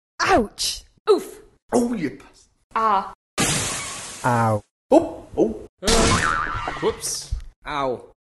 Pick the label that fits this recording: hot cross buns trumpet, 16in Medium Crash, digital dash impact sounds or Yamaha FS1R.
digital dash impact sounds